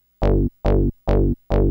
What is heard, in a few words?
Bas lon; Techno Music Maker; Bass